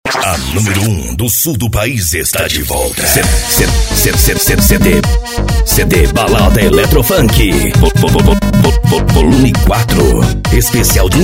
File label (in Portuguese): eletro funk; cd balada eletro funk vol4